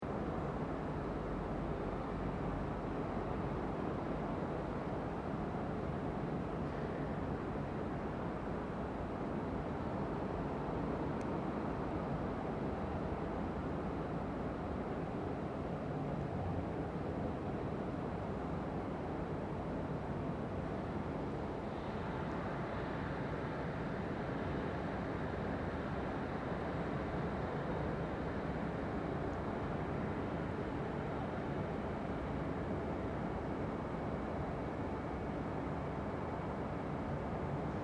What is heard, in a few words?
Traffic Tunnel Garage Fotsteps Doors Open; Under the city. Recording from inside a quiet concrete tunnel. Sense of ventilation and city above.; Traffic And Car Sound Effects; LynneMusic